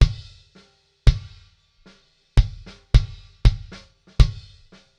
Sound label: kik